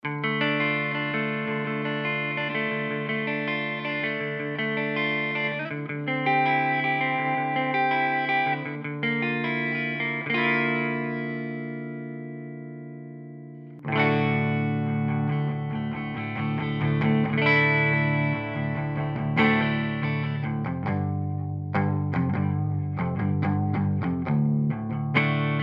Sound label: Chisel Neck Clean